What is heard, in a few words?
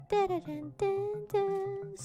Singing; IRySinging